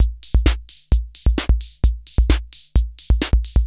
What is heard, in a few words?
KR33 ROCK; Crypton - DrumBase5000